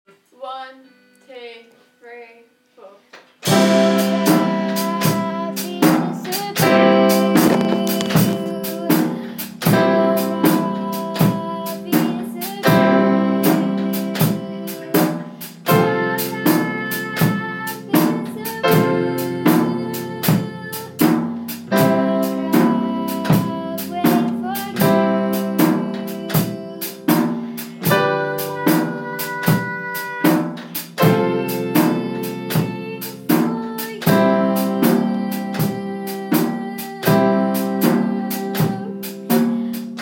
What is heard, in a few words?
12bar blues band